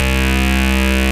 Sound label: RAVE BEEP; Best Service Alpha Dance vol.2